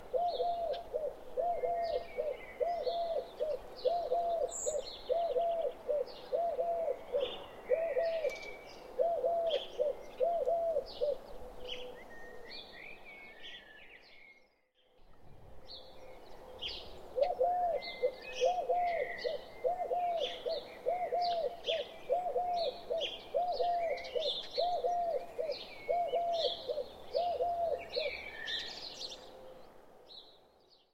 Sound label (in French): tourterelle turque; 1795 rue resistants